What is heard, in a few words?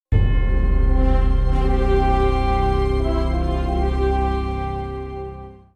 Jingle Departure